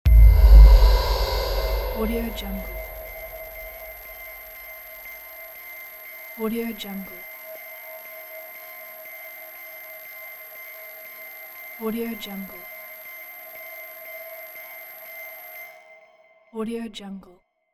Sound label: tension suspense builder